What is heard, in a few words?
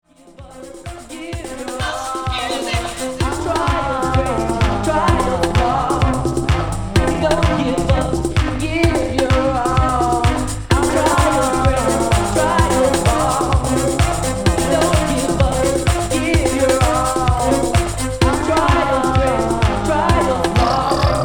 Play That Funky House Mix with drops